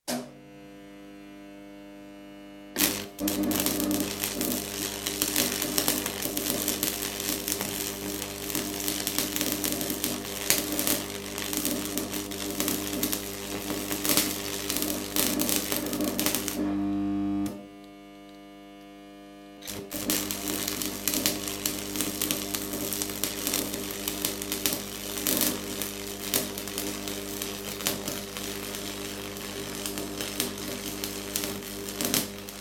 Arc welding machine, switch on, weld, lose arc, restrike, weld, off; Welder Sound Effects; BBC